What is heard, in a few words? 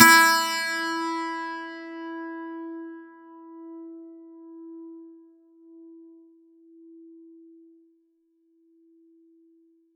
PLK 12STR E4, Acoustic and Electric, Plucked; Polyend; Simulacrum Sounds; 1 Shots